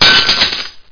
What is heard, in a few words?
Glass; PHOTON CHESS